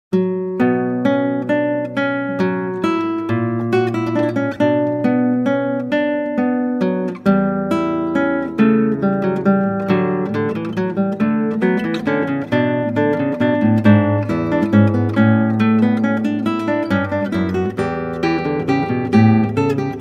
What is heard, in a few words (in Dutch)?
Klassieke Gitaar; Bach 50 Solos